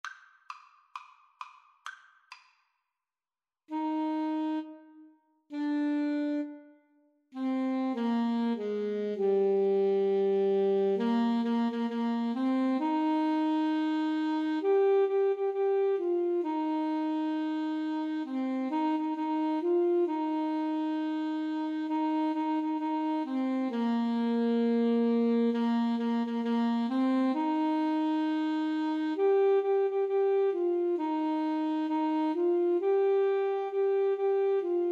clarinet saxophone duet

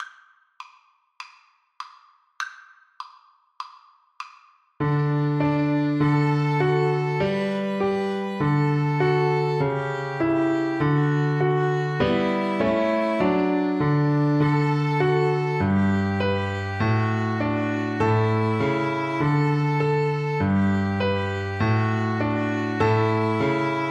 Piano Trio

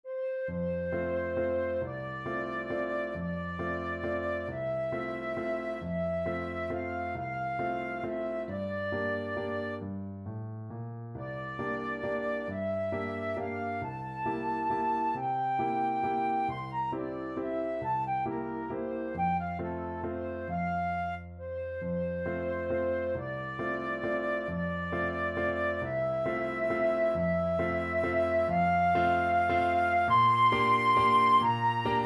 vals flute

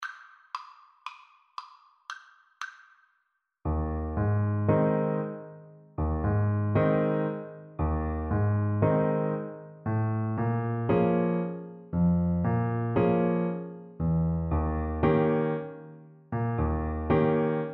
Baritone Saxophone

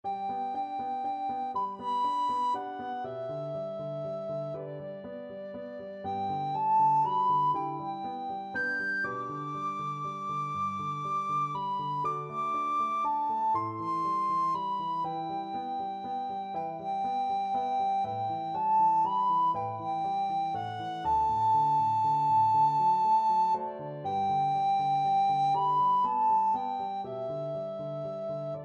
puccini beloved father, recorder

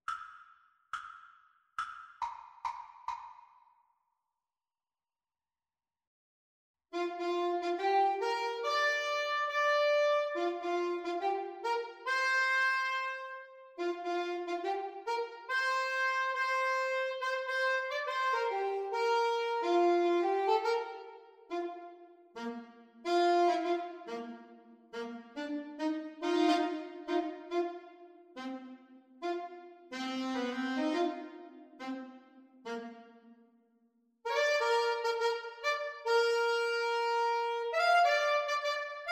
Saxophone Cello Duet

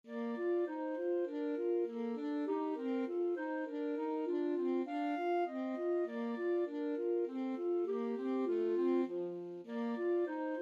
schumann melody, clarinet saxophone duet

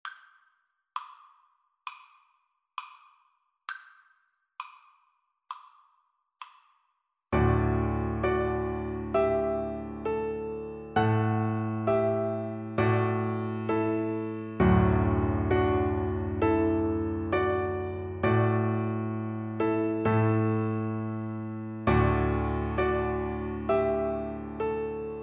beethoven ode to joy, Double Bass

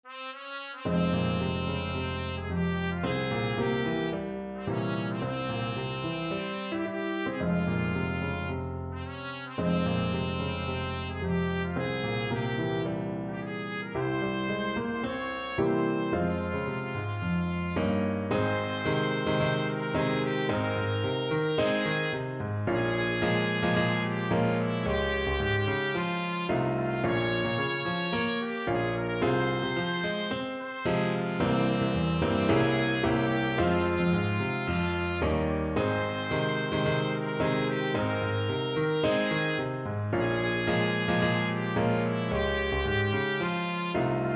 my pierwsza brygada trumpet

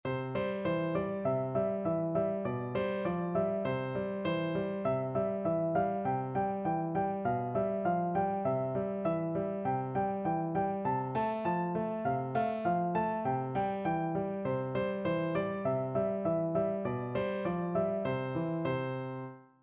PNO

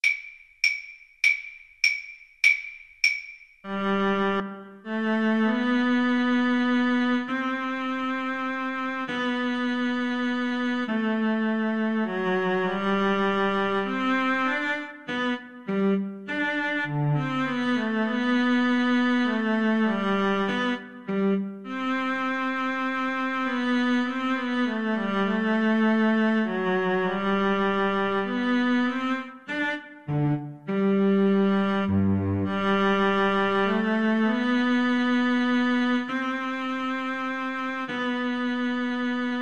minuet, Violin Duet; bach

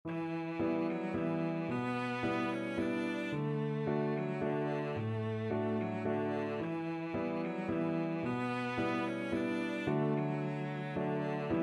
arran boat song, cello

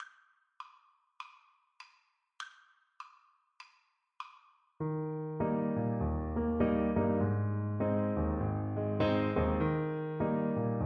Tuba

